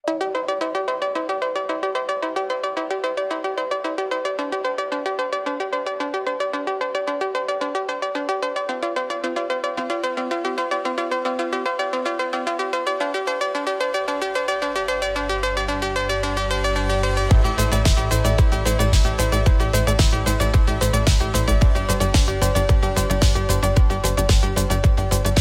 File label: Electronic Impulse